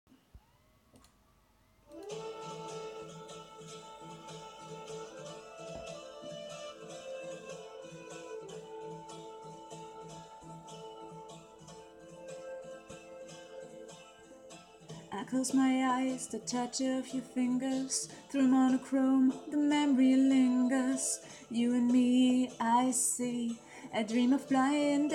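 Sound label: Karaoke, Female; Englisch Version; Kuroshitsuji Black Butler Opening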